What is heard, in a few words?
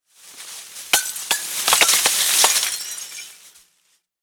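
Christmas tree with ornaments falls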